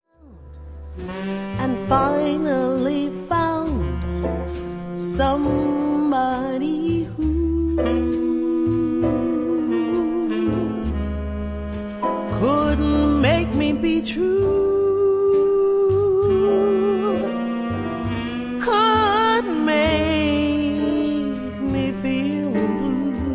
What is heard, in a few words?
Ballad 67bpm